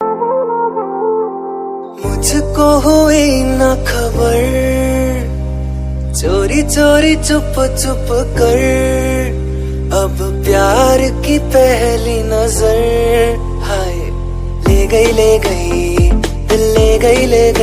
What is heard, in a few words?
ringtone, bollywood ringtones